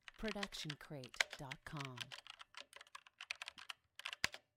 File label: computer keyboard typing sentences